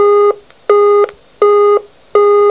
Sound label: busytone, Telephone